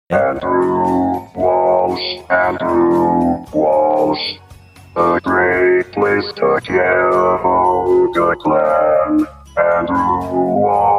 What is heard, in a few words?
playing clip of a pipe organ; saying andrew walsh a great place to cuyahoga clam